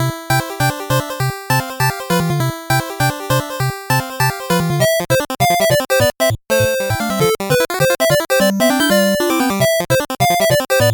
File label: 8bit bgm fast